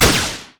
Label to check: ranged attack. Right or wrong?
Right